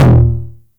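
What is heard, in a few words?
909 low tom, tape saturation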